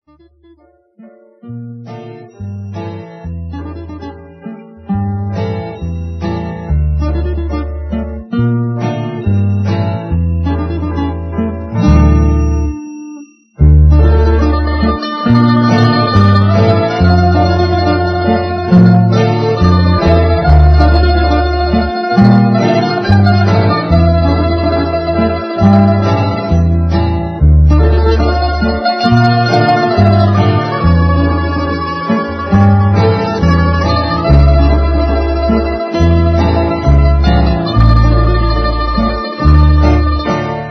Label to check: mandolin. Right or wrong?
Right